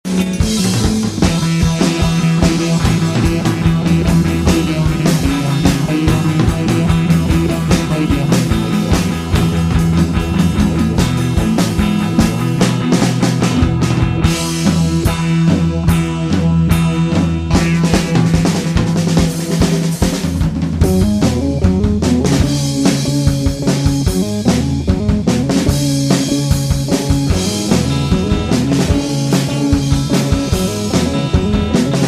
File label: drum